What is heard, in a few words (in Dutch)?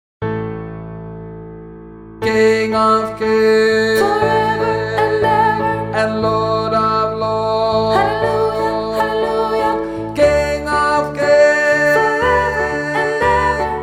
Hallelujah sopraan laag